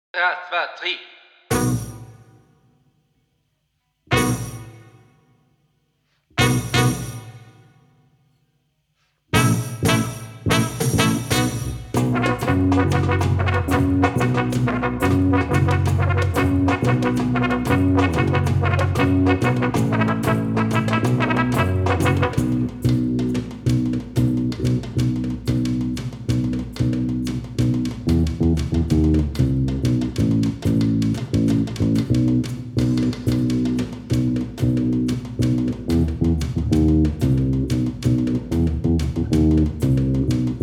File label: Desert Banjo minus 1